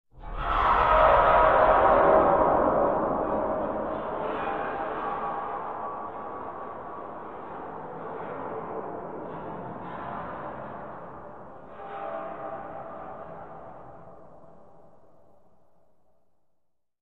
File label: Metal Scrape Eerie D, ambience